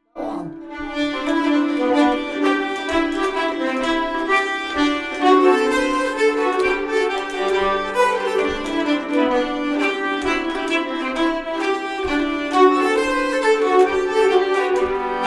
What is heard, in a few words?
Schottis; Schottis fr Harg